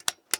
Plane P40 Switch Breaker; Airplanes

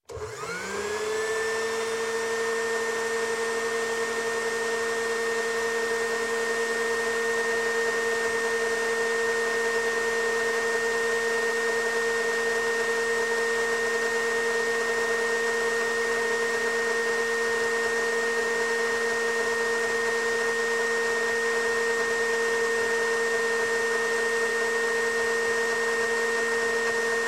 Blender, Electric Start, Run, Stop, Empty; Sound Ideas 2000 Series

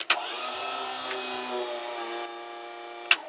Plane P40 Lever Fuel Pump; Airplanes

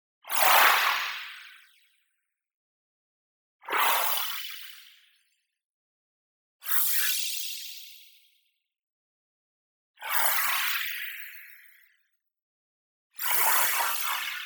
tonal, delayed appear; boom library; Anime Essentials

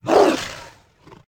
Tiger Makes Crisp Strike Growls; Tigers; Sound Ideas

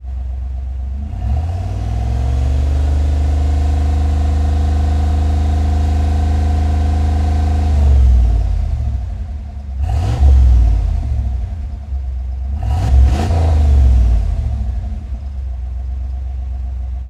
91 Firebird Fast Idle, Automobiles

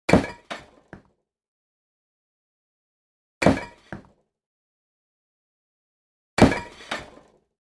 Brick Break; Hollywood Edge; Martial Arts and Human Impacts

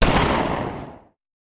Hand Gun; Sound Ideas; Guns

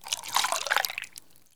Pour Juice Paper Cup; SFX Kit; Foley - Misc

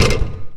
Basketball Rim

